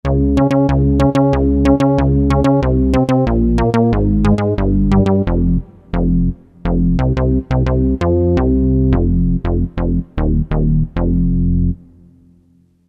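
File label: bass Fat Synth; E-mu Proteus FX; Factory Presets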